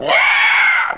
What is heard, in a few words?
screec; alienbreed3d2